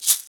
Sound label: Egg, shakers